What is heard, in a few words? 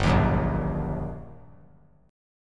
G Minor Major 7th Sharp 5th, down; GmM7#5 strummed; JGuitar